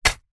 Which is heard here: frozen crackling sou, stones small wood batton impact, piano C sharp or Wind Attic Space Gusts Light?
stones small wood batton impact